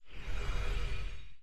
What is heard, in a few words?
Blue Room Throb